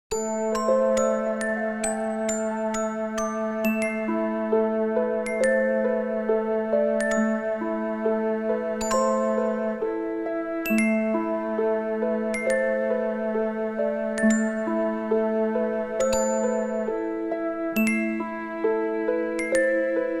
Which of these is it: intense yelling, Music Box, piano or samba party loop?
Music Box